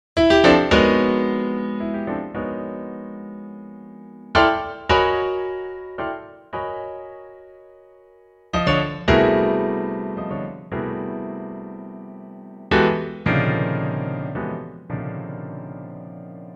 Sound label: piano